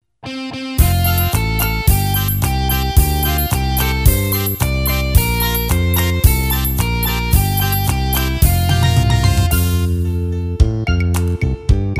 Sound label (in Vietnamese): nhac beat